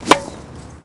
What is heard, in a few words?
tennis serve